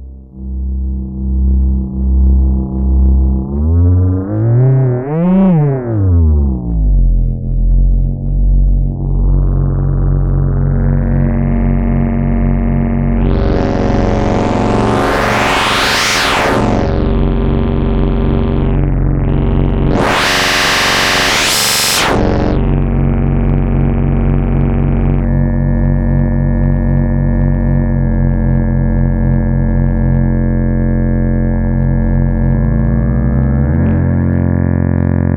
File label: spectraphon fm detune slide; Make Noise